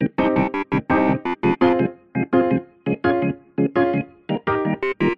STEM TECLADOS; multitracks

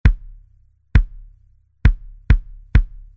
BOMBO; multitracks

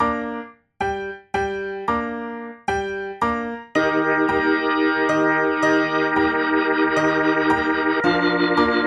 ARMONIAS

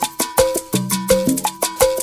PERCUSION ALL; multitracks

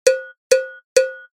CAMPANA; multitracks

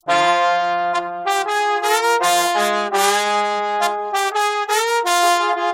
METALES; multitracks

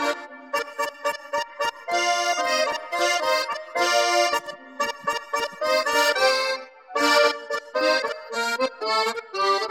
ACORDEONES

